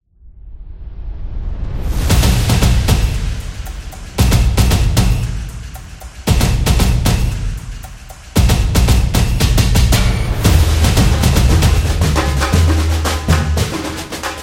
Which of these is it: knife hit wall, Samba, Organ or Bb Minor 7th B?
Samba